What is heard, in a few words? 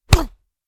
Fist Punch Vocal; noise creations